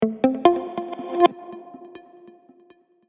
notification sound ringtone for phone

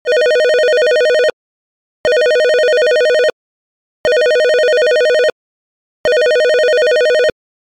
classic 90's ringtone sfx

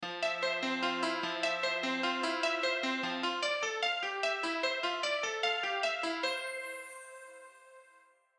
ringtone jungle

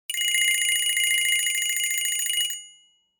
phone ringing

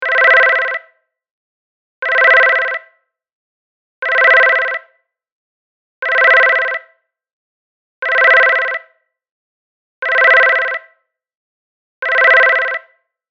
ringtone

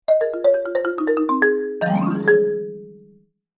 marimba ringtone

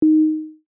short punchy sine wave ding, D

